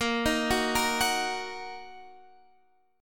Bb Suspended 4th Sharp 5th Bb down, chord; Bbsus4#5 arpeggiated

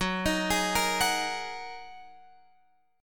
F sharp 6th Add 9th F sharp down Guitar Open F; arpeggiated; F#6add9

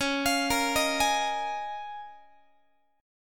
C sharp 6th Add 9th C sharp down Guitar; arpeggiated; C#6add9; JGuitar

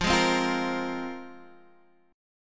F Minor Add 11th F; Fmadd11 strummed; JGuitar

